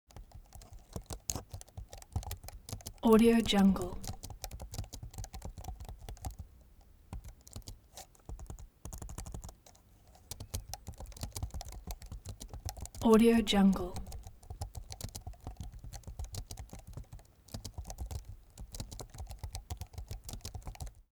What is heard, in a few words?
office keyboard laptop type sequence soft; foley; smartsoundfx